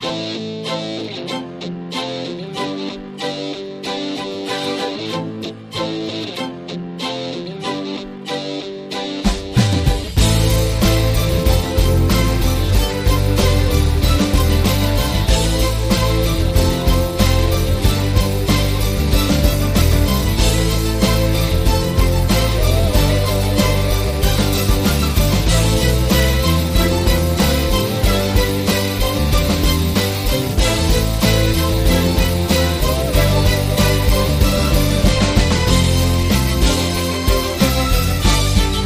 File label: Pop Rock